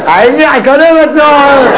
ricaner

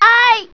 aie

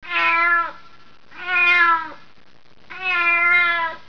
chat râle

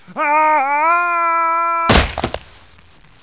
chute

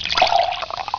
verse liquide

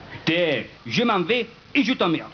canto

